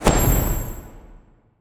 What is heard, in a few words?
Affinity Good; AudioWizards